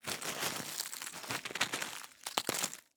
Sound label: Ice Crush, Icy Snow; Soundopolis; Fienup